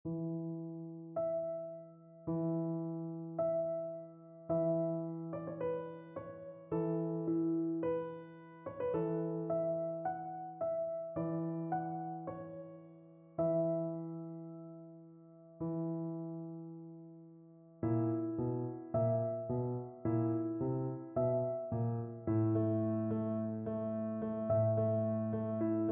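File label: electric piano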